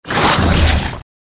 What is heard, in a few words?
Twoheaded Dog Up